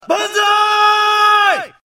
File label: war sounds